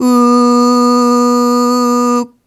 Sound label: Ooh Short B2 Dry; Harmonic Building Blocks